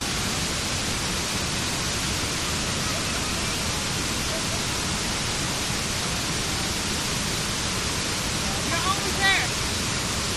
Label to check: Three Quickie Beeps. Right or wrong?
Wrong